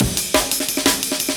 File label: amen